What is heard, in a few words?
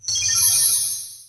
Magic Staff Fire